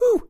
Victim Miss Male; friday the 13th killer puzzle

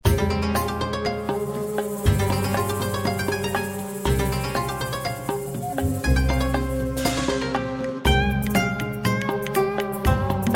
Japanese Music; Doki Doki Universe; PS Vita, PS3, PS4

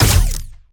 Gunauto Plasmid Machinegun Single; Robobeat

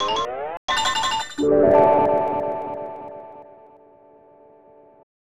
Mysterious Fanfare; porky pig s haunted holiday; snes

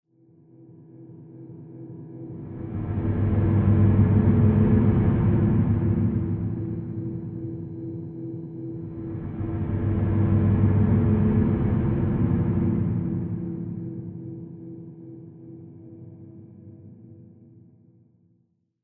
Subway Gas Mask Explore Mood

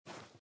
run sand

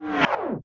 Evict; Tds Sfx Evict

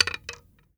Arrow Nock; Timelock VR

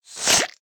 Kiosk Juice Squeeze

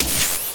atak elec; Nurse

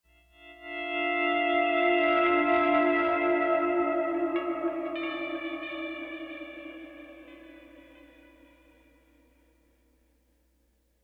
Pleasant Guitar; ML Pleasant Guitar Mood 16

